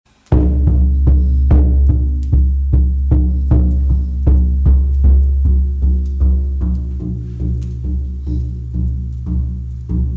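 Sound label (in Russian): шаманский бубен на фоне костра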